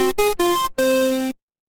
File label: retro game game over